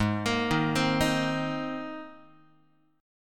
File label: G sharp Major Add 9th, Guitar; G#add9 arpeggiated; JGuitar